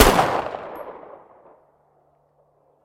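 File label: single shot in a field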